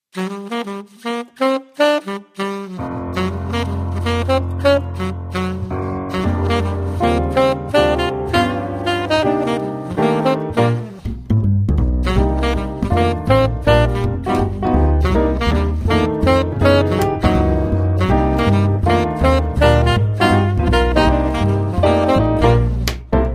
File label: instr Sax Trio